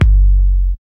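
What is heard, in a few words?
bd, 808 bass drum; Sound & Vision - Gigapack I; DANCE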